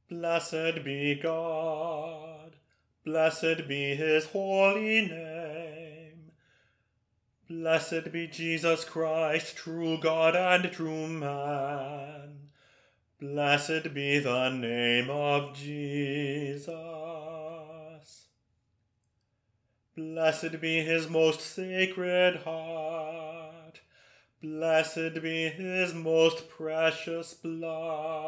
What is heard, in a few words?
Divine Praises Bass